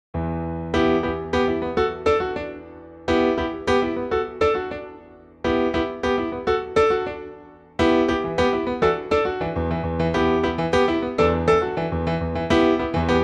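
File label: Em Piano, male; key - Em - vocal range - A to B (optional E big note - "measure"); Punchy and bright piano arrangement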